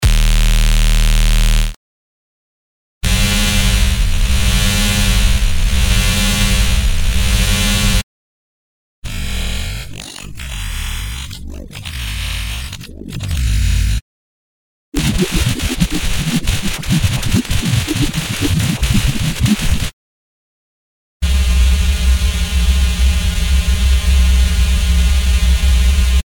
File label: Fat Bass